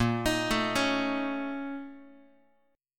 A sharp Minor Major 7th Double Flat 5th, down, Guitar; arpeggiated; x,6,6,7,5,x; 4A,4E,5A,5D,5G#,6C#; JGuitar